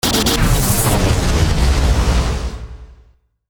zap whoosh; transitions and movement

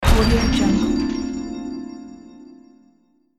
a magical explosion sound

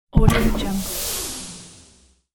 alien geyser deploy; futuristic; شلیک لیزر

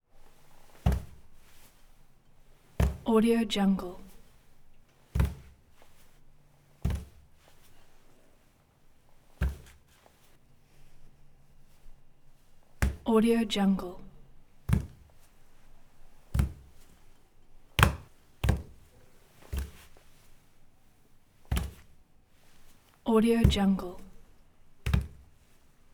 body fall on bathroom floor quiet thumpy; human sounds; افتادن بدن روی کف حمام

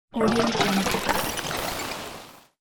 game spinning mechanism release; چرخیدن چرخ دنده ها

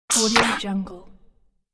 game gurgle paralyze

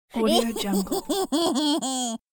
nasty kid girl laughter; human sounds

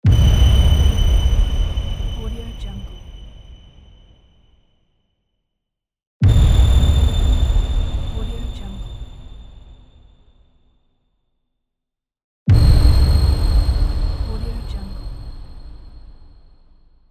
ringing hit; transitions and movement

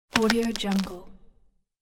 rotten tree branch crack; nature sounds; خرد شدن شاخه پوسیده

